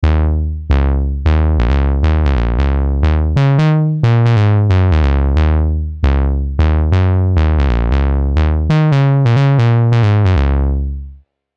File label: Loose, Ebm, 90bpm, Basslines